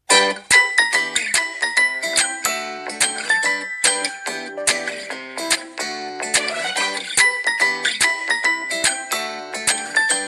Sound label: z 3 ringtone